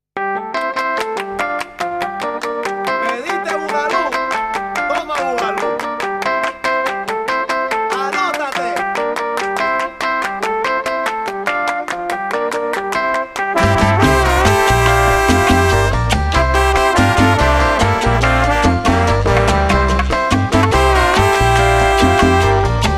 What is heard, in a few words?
Salsa